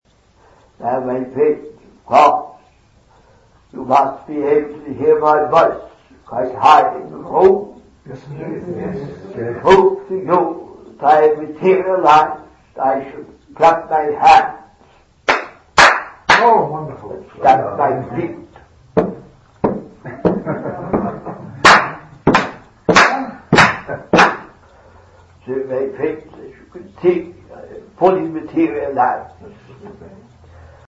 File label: claps